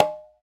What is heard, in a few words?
QUINTO, Conga Battery